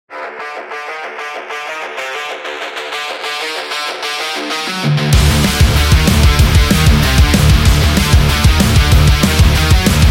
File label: This Is The Metal